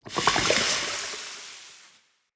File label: Gas Burst; Ambience; Bromius Inside